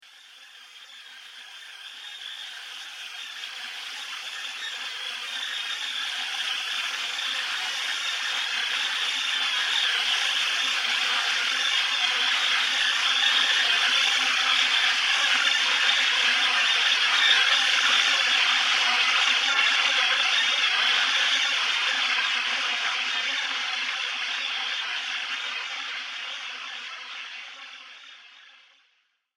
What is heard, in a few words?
Killer Swarm Of Death, monster sound effects; horror sound effect